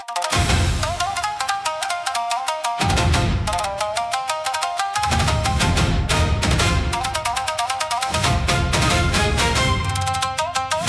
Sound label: Kensei Thunder, cinematic japanese style battles